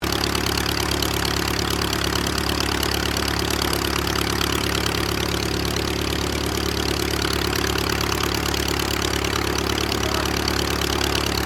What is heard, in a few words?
AIRCRAFT AUSTER III IDLE STEREO; Airplanes